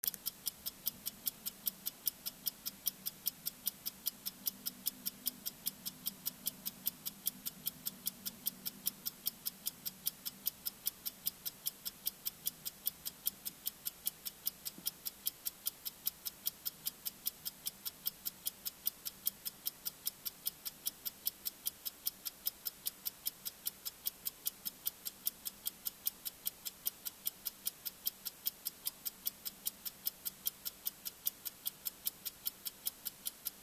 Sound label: tick